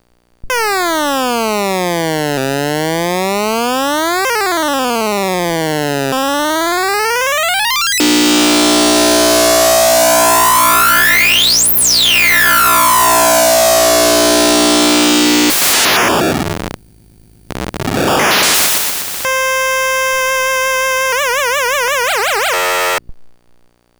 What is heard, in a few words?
pitchbend cgb; gbsoundcomparison